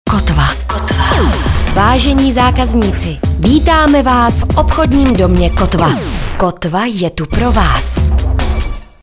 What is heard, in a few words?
jingle mix